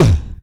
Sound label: VOC DRUM, MOUTH PERC; Best Service Hyper Dance